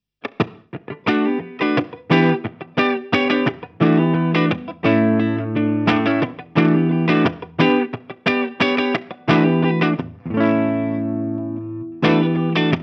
Harley Benton SC 550 II DFB Clean

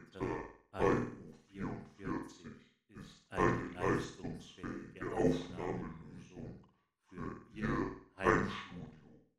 Detuned Audio; Presonus io44

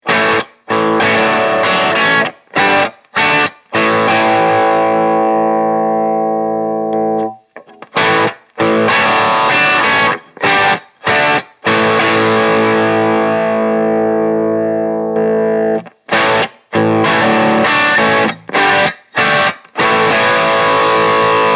Blues 3 Tube Stages 1 5

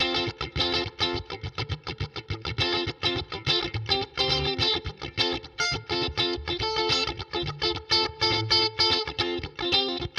TWN Vibrato; Neural DSP Quad Cortex

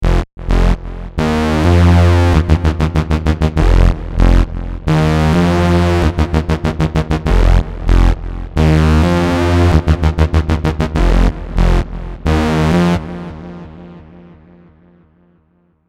blue bass

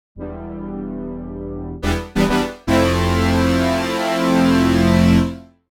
Syn Horn

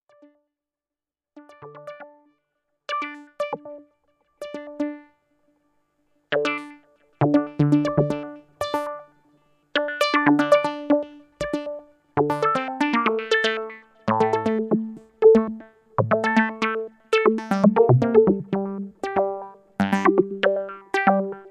ps3100 sequ

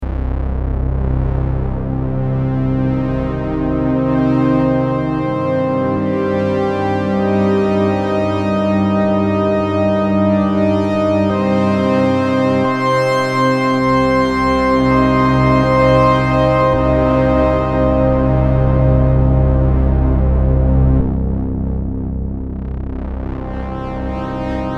softpad

